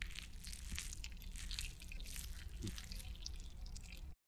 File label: stylized squish; soundscrate